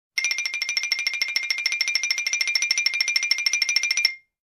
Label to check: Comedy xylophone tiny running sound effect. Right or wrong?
Right